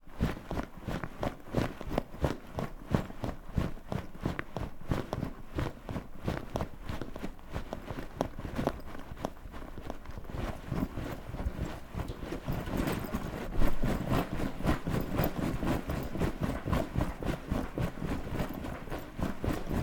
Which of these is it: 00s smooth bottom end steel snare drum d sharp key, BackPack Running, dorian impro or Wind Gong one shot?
BackPack Running